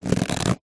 rubber squeak; foley; zapsplat

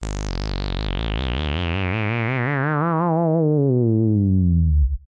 synth bebot sawtooth robot wobble rise power up; Jessey Drake

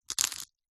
food pepper mill plastic turn grind single; zapsplat

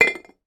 household ceramic ornament lid put on

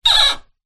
household tap faucet shower squeak turn

